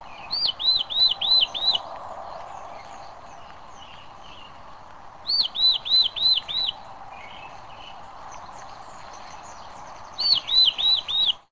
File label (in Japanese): hashibutogara, bird; shijyukara